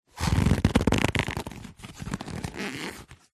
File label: cardboard envelope box handling rough fingers rub; zapsplat